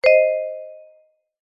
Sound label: multimedia notification bell chime tone vibraphone